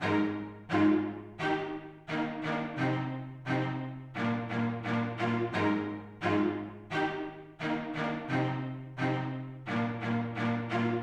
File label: String Loop, 174 BPM C Min; String Loops; Future Bass; Instruments; Moonlight; Cymatics; Future Bass Starter Pack